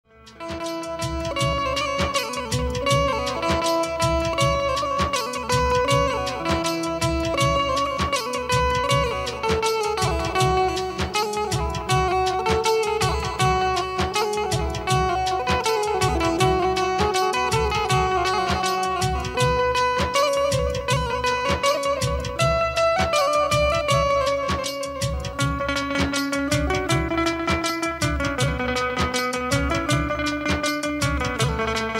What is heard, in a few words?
banjo ringtone, desh bhakti song